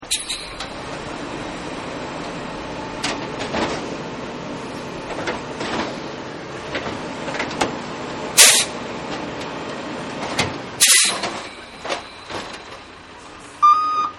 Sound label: Garbage Truck; Trucks; Vehicles